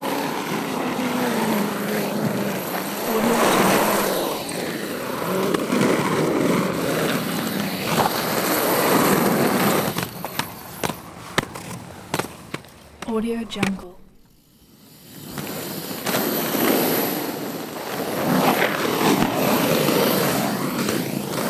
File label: roller skate constant circling single person; prosoundfx